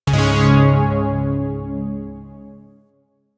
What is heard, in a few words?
Shawzin Chromatic Chord B; Zariman Shawzin